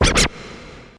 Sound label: combobreak; Luxariah Default Minimal